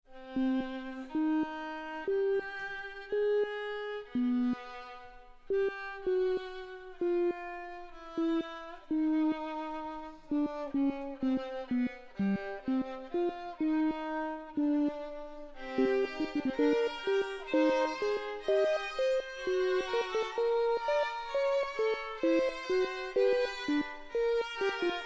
Sync Sine Soni

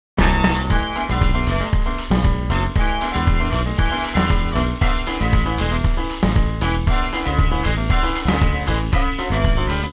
Jazz; TSMtoolbox

